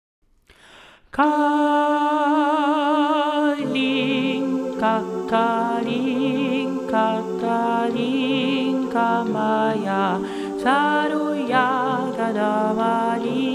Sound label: kalinka sopranes